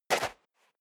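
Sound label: step dress boots sand single left, footsteps; schritte; menschen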